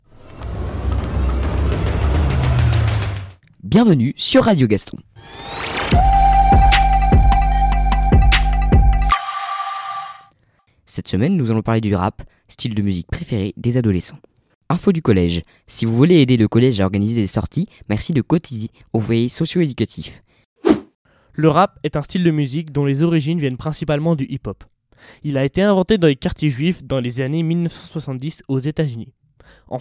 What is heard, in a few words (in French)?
Le rap